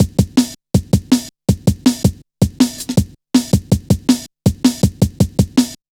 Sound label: drum loop, 161.5; Zero-G Jungle Warfare 1, 2 & 3